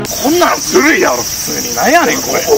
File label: fail sound; osu skins; nautzz